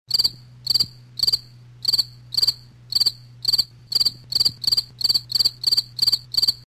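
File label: short sound of cricket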